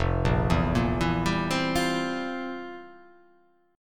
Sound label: F Major 7th Suspended 2nd Suspended 4th, chord; arpeggiated; JGuitar